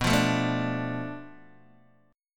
B Minor 7th Flat 5th B Guitar DADGAD

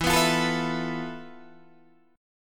F Major 7th Flat 5th E, guitar chord

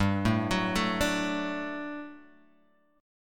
G Major 7th Suspended 2nd, Guitar, Open G

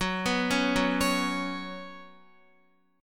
Gb Suspended 4th Gb, down, Guitar; arpeggiated; JGuitar

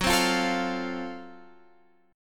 F sharp Minor Major 7th Flat 5th F sharp down

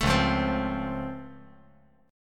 C sharp Augmented Major 7th, C sharp, chord; 2,1,1,3; strummed; JGuitar

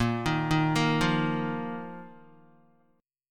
Bb Suspended 4th Bb down Guitar; Bbsus4; arpeggiated; JGuitar

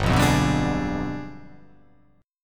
G 7th Flat 9th

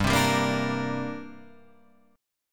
C 7th Suspended 2nd

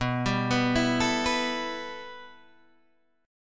B 7th Suspended 4th Sharp 5th, Guitar, DADGAD

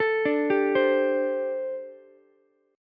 G Suspended 2nd Suspended 4th, Ukulele; Gsus2sus4 arpeggiated; JGuitar

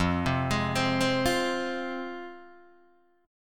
F Minor, Guitar Open D; 3,3,6,6,3,3

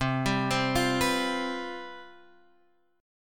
C Major 7th Suspended 4th C down

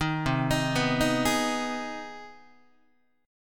C Minor Major 7th Sharp 5th chord, Guitar; CmM7#5 arpeggiated; JGuitar

